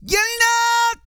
REGGAE, Vocal Planet World Voices

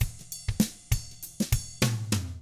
funky, drums

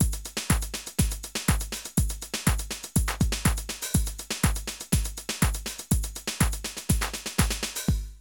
drum loops, House & Garage; Zero-G Groove Construction (1993)

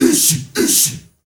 ISHIISHI R, GRP CHANT, Heart of Africa; Spectrasonics; Heart of Africa 1

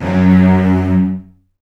F#1, Virtuoso Strings; ILIO; Kirk Hunter Virtuoso Strings VOL-2; 10CL TRM FX

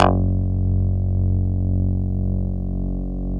SNARL F#1, BASSES

